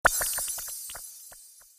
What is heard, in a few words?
huge chain; game; guodongrenwu